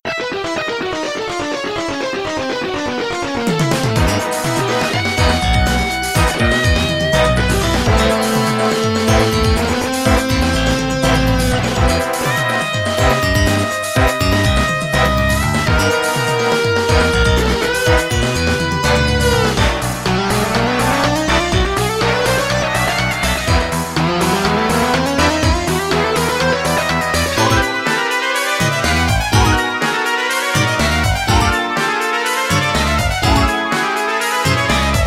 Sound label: Scout Battle; Dragon Quest Monsters Joker 2